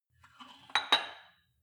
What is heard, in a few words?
weight rack insert weight medium, fitnessstudio